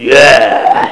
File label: Super Shot Got; Crystal Crazy Demo; Casady & Greene; Super Gun